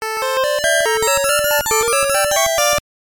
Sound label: Jingle; Umi no Nushi Tsuri 2